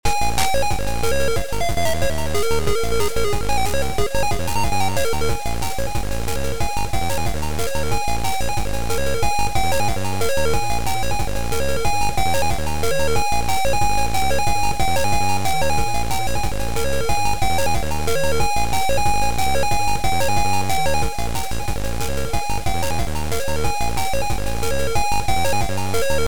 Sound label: BASS; PuT